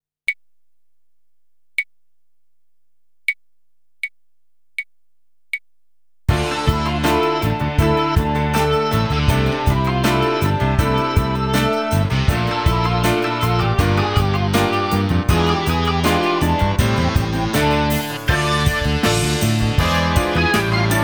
Rock Groove

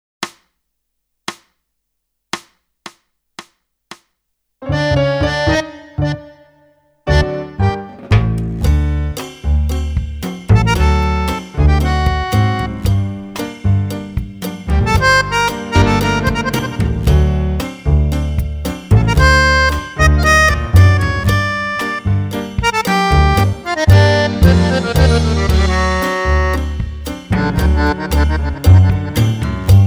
Tango

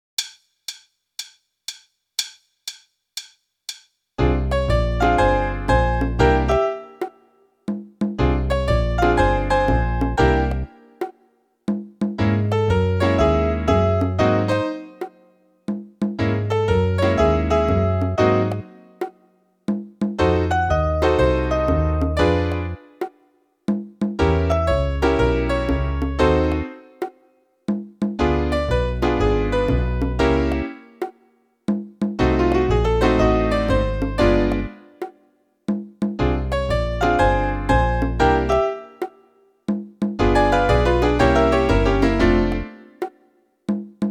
Swinging Fills; Meespeel CD

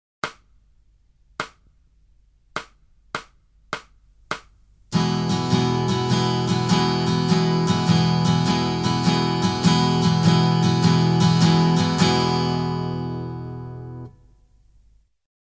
Shuffle

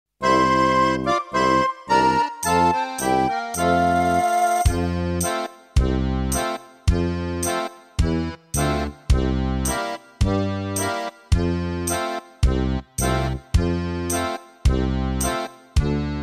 London Bridge, gitaar

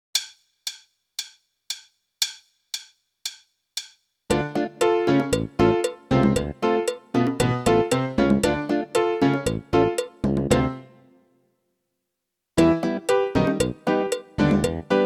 Latin Rock

